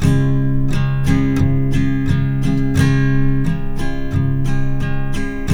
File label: Guitars; Spectrasonics; Hans Zimmer Guitars VOL-1